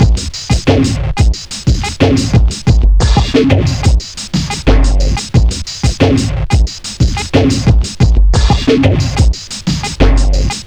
interupter 090bpm, Downbeat Dance Grooves; Zero-G

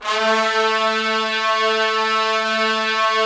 Trumpets; Roland - LA Composer vol. 4

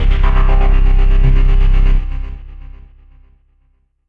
Pulsating Bass; Basses; Dr. Fink's Funk Factory

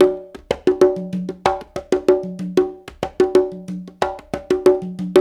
93, Latin Congas; Spectrasonics; Supreme Beats

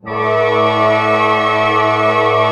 PENTA D# PP, orch penta; Sound On Sound; Peter Siedleczek's Orchestra

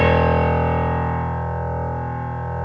WARM PIANO

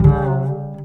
BASS, 140 BPM, Drum & Bass; Ueberschall; Drum & Bass Flash Trackz

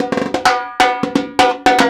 Percussion Loops, Brazilian; Houseworx

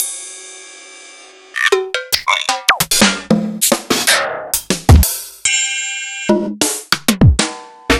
EMU Proteus-2000; m8

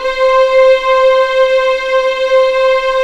C5 LEG P, VIOLINS; East West; The Ultimate String Collection

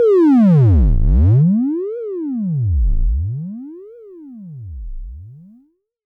warble, Korg ER-1; Kit 21

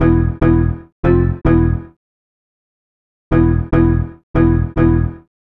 Battery Bass; Sonic Foundry (Sony Creative Software); Club Joints and Street Anthems

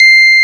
PUNCHREEDS; Wizoo - Powered DX

